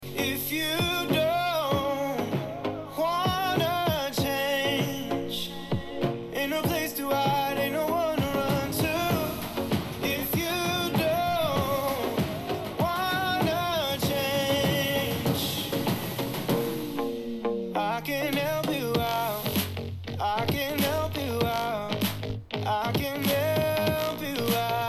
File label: Help you out without bass